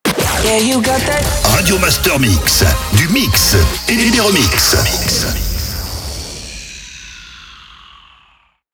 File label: Jingle; SPEED; Radio Master MIX; Habillage